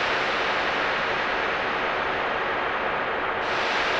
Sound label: sparse soundscape samples; ReLooped